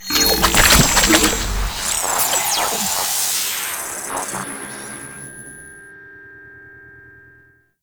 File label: UI infested pop up; Lich; Warframe; Kuva Lich